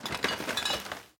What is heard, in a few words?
Refrigerator Short; Peace, Death